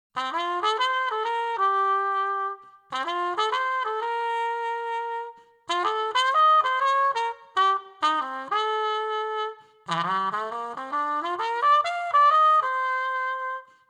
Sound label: Blues